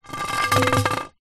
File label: sport pool cue drag hard ground